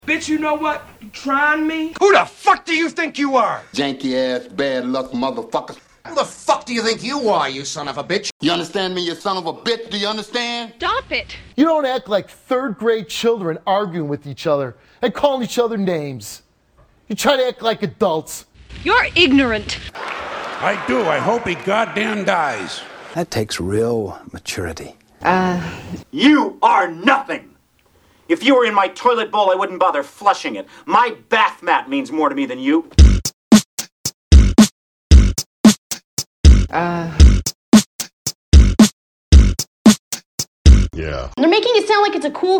cuts beats breaks samples stuff; threepeeoh

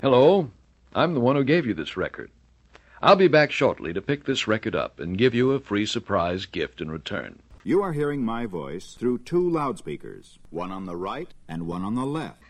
cuts beats breaks samples stuff; threepeeoh

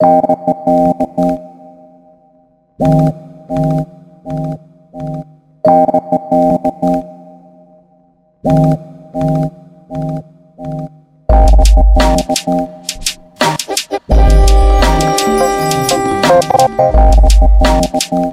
polish jazz thing

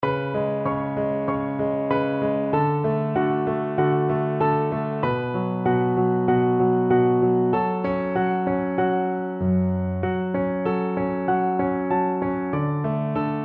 Piano Accompaniment

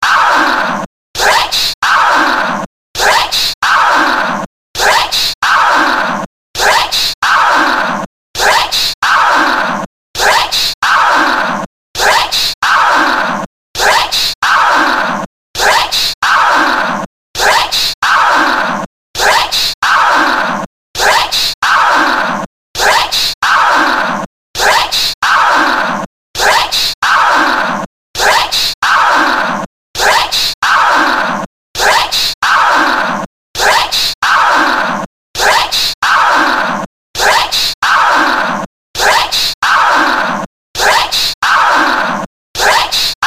skip scratch

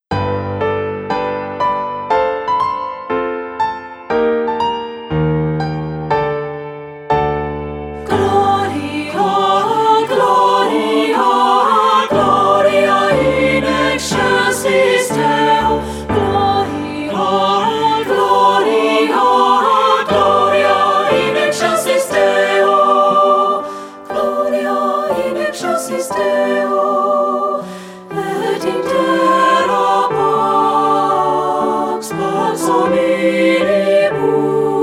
Fanfare; Gloria

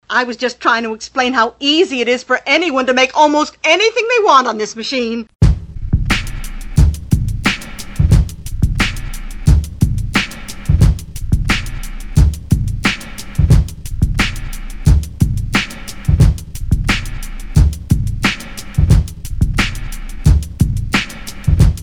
cuts beats breaks samples stuff; threepeeoh

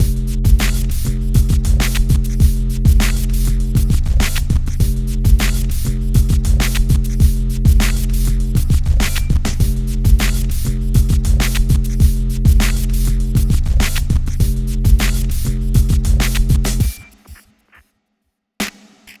bonus beat

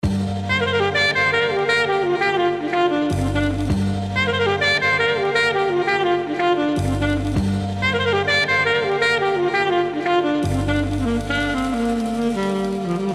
Blues

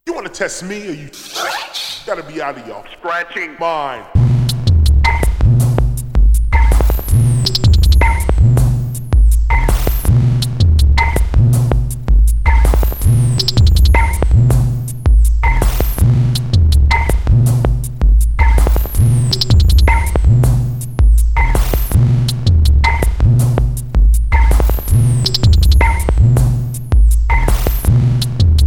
Beat

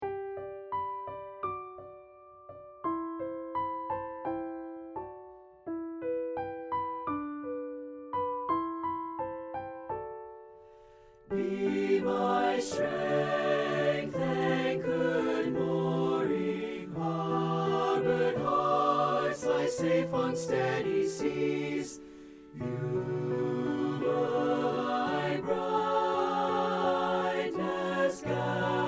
Treble Choir; Safe Harbor

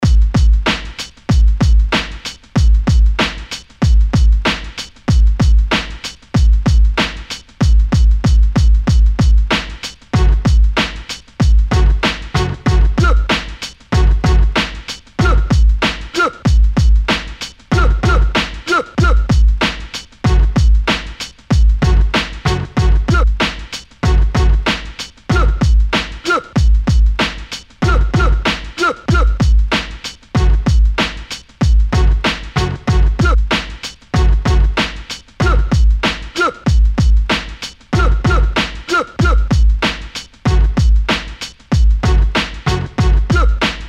Belt Drive Breaks, Break

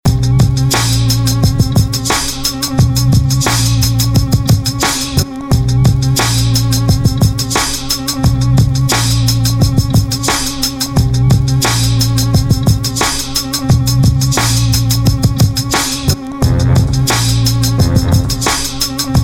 loop

